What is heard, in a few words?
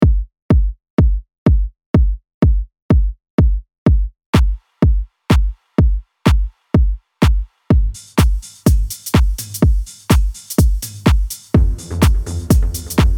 deeper drums; Deep House; Artist Dappledark Studios; BPM 125